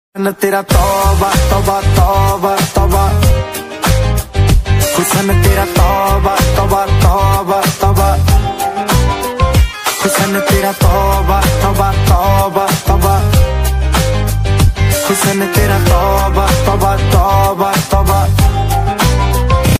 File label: ringtone song